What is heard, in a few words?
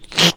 shitting; ShittingSimulator